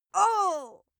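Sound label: female pain, screams; schreie; menschen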